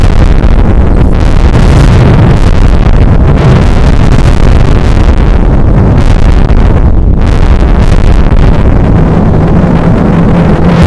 extremely loud bass boosted sound

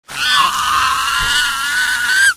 firey scream

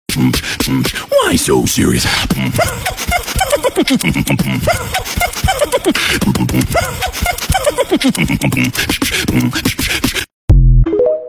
rap; jonkler

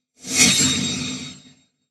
stinger

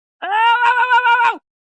cake screaming; bfdi

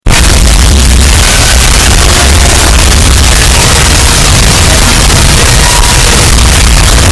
earrape

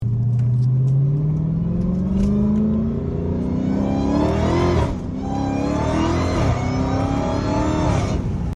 hellcat whine

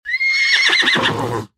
horse neighh

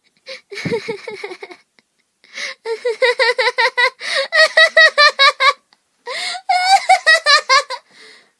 hahahahahahahahahhahahahahahah, yandere laugh

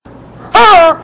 rubber rooster; galo

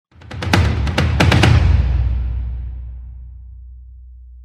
epic drum hit

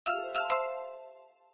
jingle; devsisters

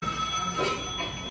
infomercial effect

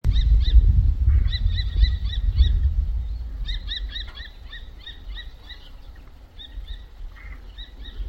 forest ambience

